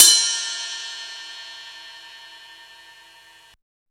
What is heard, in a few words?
Ride set, Drum and Bass; Ride Set 2; Instrument Samples